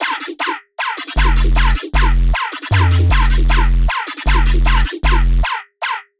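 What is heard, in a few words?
virtual trappy loop 04 f minor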